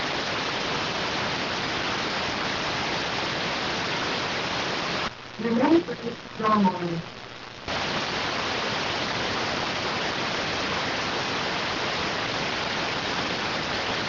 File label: rtty rx